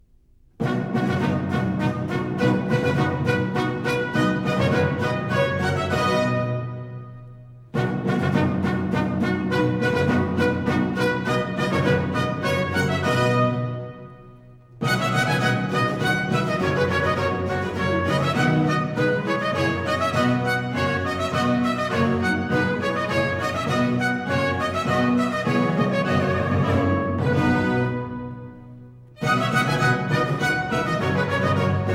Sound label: Trumpet Tune; Trumpet Tune Reprise; Sir John Eliot Gardiner, Monteverdi Choir, English Baroque Soloists; Semi-opera Z. 630, 'The Indian Queen'